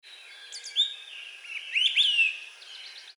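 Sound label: Brown Thrasher Mimicking Olive-sided Flycatcher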